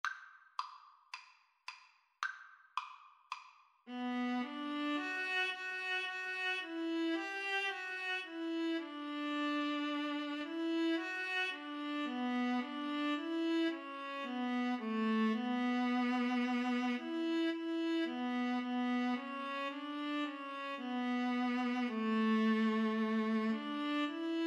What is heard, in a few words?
emmanuel, Viola Cello Duet